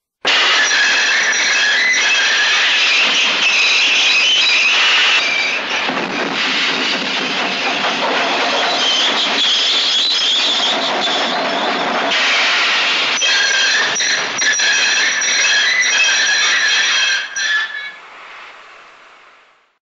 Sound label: train brake; ترمز قطار